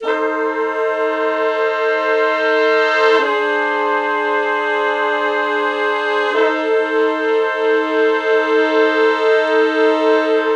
Slo Blues Chords [I, IV, I, I] (F); Sections; Sonic Foundry (Sony Creative Software); Horncraft for R&B

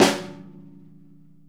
snare; Sonic Reality; Interactive Drum Kits

